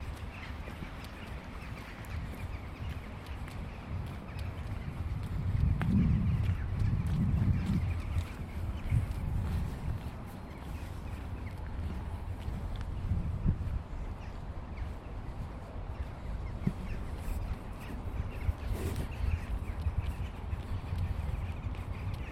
massive rookery preparing to roost with voiceover soundscape birds; 8 minutes